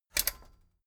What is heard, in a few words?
metal button stereo click enable heavy